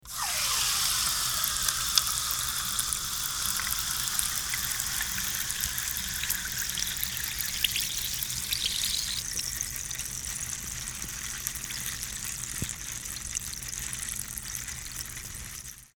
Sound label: soda